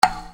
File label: Percussion, EuroDance